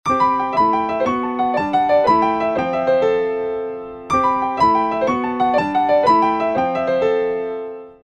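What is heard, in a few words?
piano, ringtones; China Mobile A4s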